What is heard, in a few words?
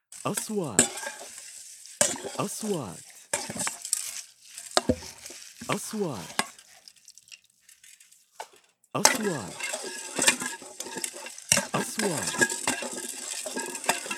cook spoon mixture; kitchen